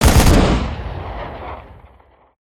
gunstand machinegun fire loop, turret; gow; turret judgement

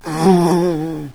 zombie death; doom3

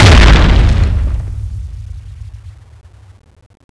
missile explode; tfa cso; magnum lancer

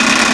engine damaged, vehicles

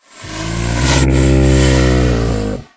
worm idle growl, giant worm

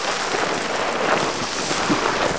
dirt roll, vehicles

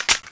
clip out; tfa cso; sterling

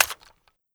mag out, scar; mas scar; cw20 extras

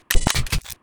shotty turret cycle

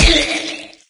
pain right arm; doom3

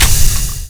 box open

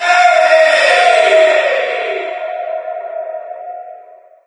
distant screams; doom3

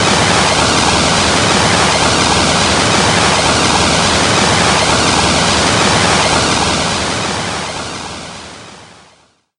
poison mist cloud

